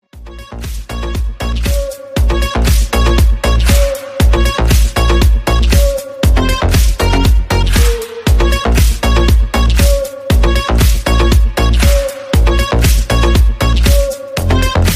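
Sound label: Morse; MORZE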